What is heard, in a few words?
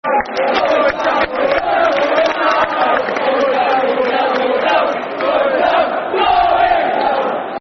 Going Down Football Chants (Soccer Songs); Middlesbrough